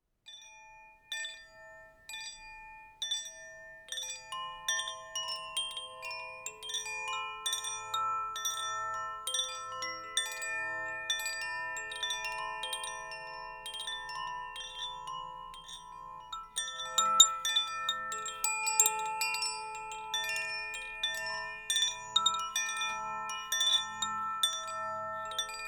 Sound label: Koshi Solo